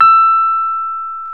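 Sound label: piano Rhodes Mk.V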